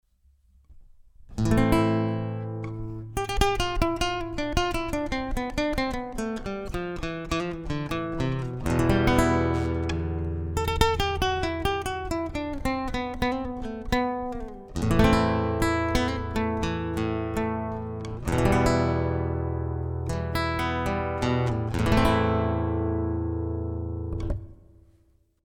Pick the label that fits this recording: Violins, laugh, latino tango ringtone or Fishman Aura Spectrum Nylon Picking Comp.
Fishman Aura Spectrum Nylon Picking Comp